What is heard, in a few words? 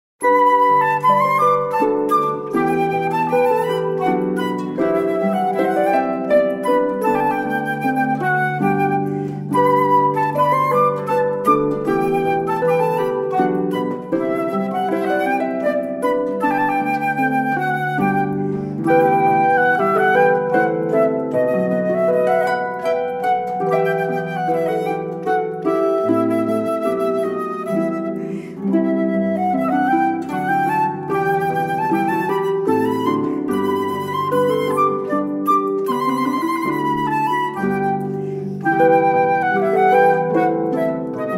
Hornpipe, duos